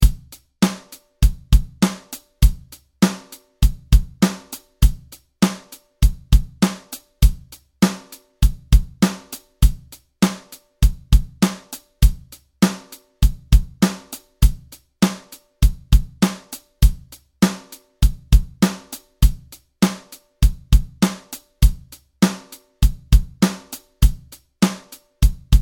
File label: drum grooves